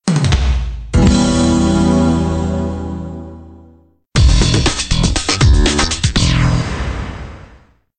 Cabaret Club Jingle; Black Panther 2 B-Sides; PSP

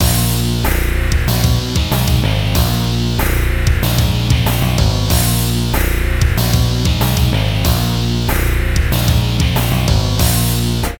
Rockconcert; My Boyfriend Verliebt in einen Star 2009 NDS gamerip

